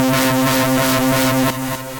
Broken Mono Synth, synth lead; Ableton; Glitch and Wash

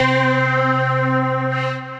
Sponge Cake Keys, Synth Keys

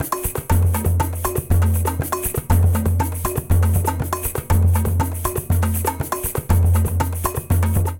Samba 2 120bpm, Latin Percussion; Ableton; Factory Packs

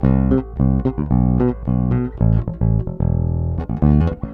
110 Old School Bass C, E-Bass; Pop Rock

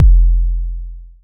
analog attacked, Kickbank; Ableton Foundation FreePacks

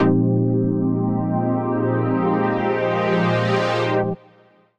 Brass (Bb) 100bpm; Music Loops; Prophessor Cinco Brass; Ableton; Factory Packs; Singularities

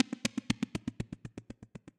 Clap Delay; Percussive; Ableton; Glitch and Wash

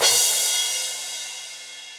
Crash 16, Cymbal; Drums; Drum Hits; Standard Hits; Ambient Loud Punch; Session Drums Studio

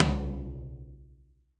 Tom Floor Reso Classic; Drum Hits; Ableton; Factory Packs; Session Drums Club; MPE Hits

